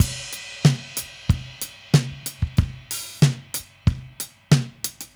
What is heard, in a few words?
drums crash, analog funk; Mixed Berry Jam; Sonic Foundry (Sony Creative Software) - What it Is! 70s Analog Funk